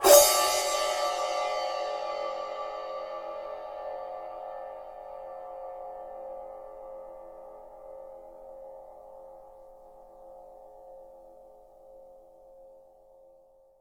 crash sound clip d key, Royality free crash cymbal drum sample tuned to the D note